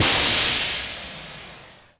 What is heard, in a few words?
steam; Shadow Man